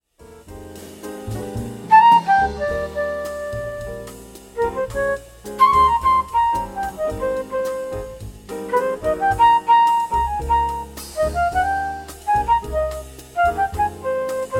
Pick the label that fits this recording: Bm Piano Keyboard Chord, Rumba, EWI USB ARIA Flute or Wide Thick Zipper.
EWI USB ARIA Flute